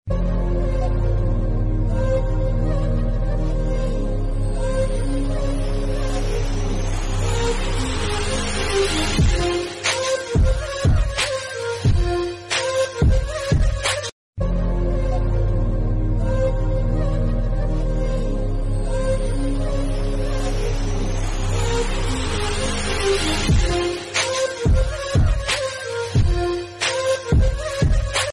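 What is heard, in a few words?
villain mass bgm theme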